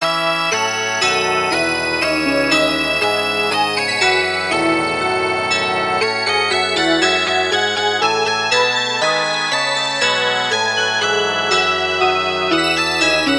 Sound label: dance; Auntimoanian